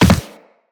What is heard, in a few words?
Land Soft Default, Footsteps; Characters; Operator